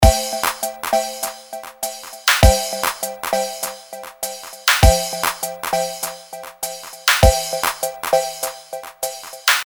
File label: delay